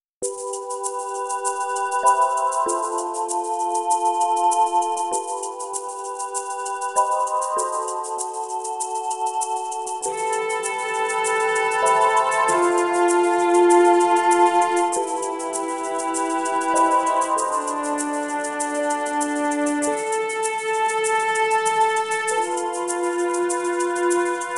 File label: Swoosh